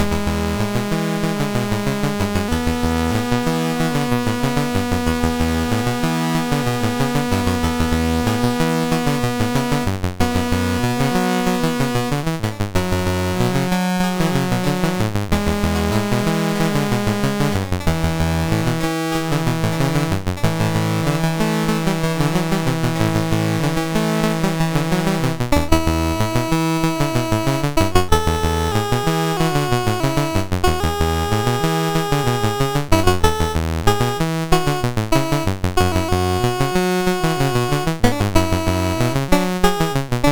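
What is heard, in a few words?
Magicians Ball, MOS 6581 SID chip music, games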